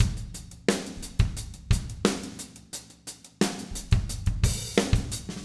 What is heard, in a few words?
088 Rock Drums Verse; Pop Rock; Moderate Tempo; Pro Tools Essential Loops; Digidesign